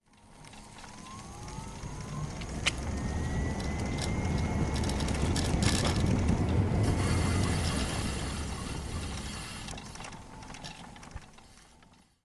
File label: e scooter electric bike outdoor open road start to fullspeed to stop windy ride braking; sonniss gdc